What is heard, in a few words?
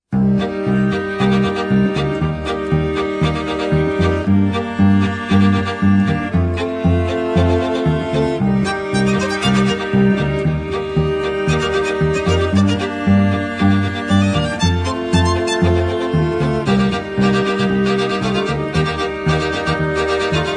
String Quartet